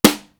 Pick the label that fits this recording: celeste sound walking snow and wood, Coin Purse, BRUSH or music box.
BRUSH